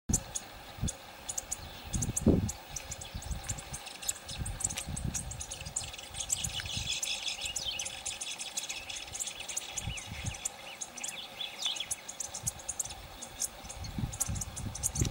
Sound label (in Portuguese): Canto do cigarra do campo